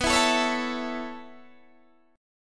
B 7th Suspended 2nd Suspended 4th down Guitar Open D, chord